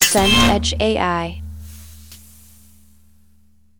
hail on glass

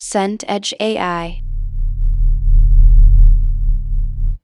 wind low

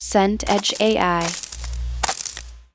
footsteps sand

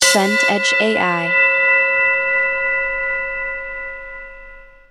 bell large

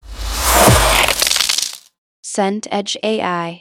car skid gravel

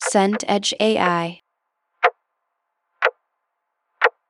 clock ticking

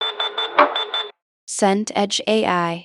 microwave beep

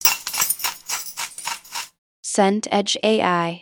keys jingle

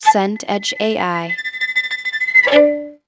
violin tremolo

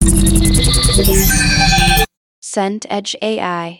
teleport out

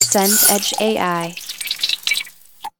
pour liquid

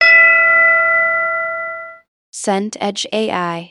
tubular bell strike

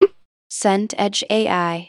hiccup

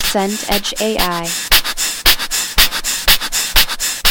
breath heavy exercise

